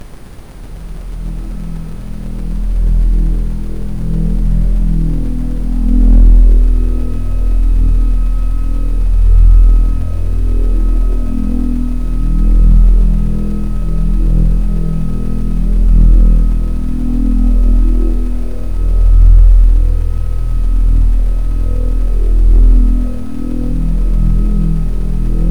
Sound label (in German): Rife Ideen Welle mit Ambient Sound; rife frequenzen